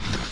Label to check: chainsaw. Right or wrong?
Right